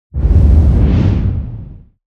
cinematic whoosh deep squall heavy short

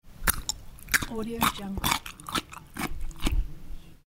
champing gnaws cucumber

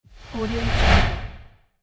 power swish

